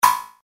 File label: Metallic Buzz Click